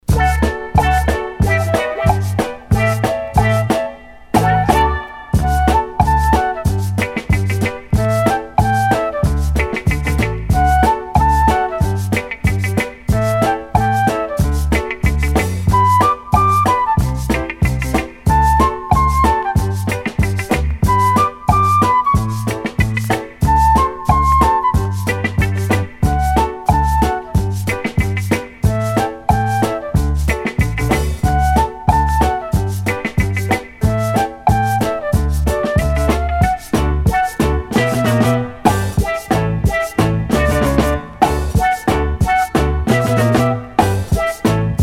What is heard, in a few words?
flute reggae